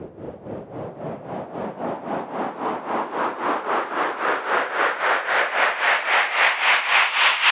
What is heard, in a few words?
Gate Sweep, White Noise